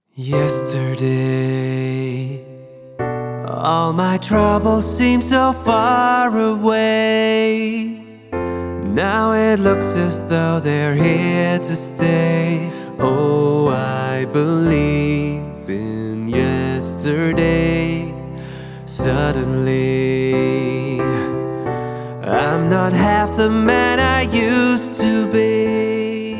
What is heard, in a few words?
Pop Piano